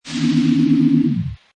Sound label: Hi Tech Panel Servo Button